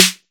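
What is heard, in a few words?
raw snare f sharp key, steel snare drum tuned to the F# note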